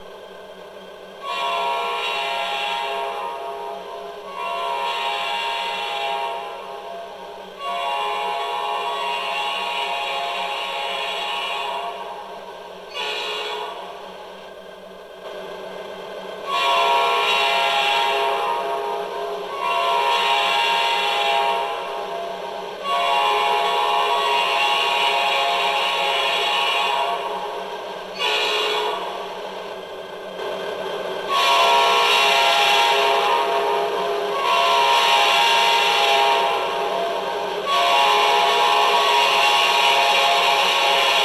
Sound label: diesel volume